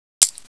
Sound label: knife draw; weapons; knife gypsy; cstrike; csgo